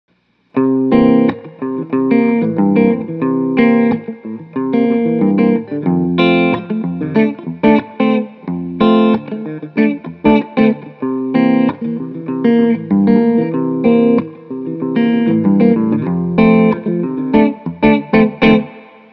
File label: Clean; COMP-1